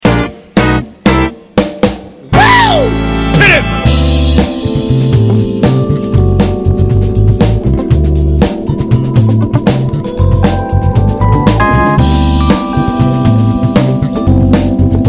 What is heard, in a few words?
rhodes Solo